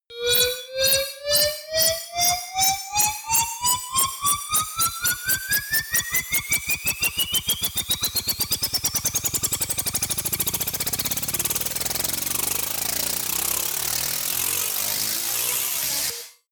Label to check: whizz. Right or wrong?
Wrong